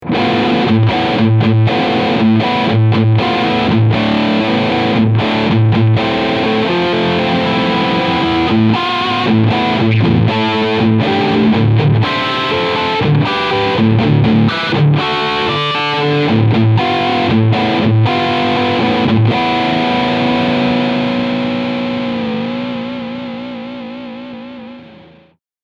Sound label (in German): Riffsound Steg PU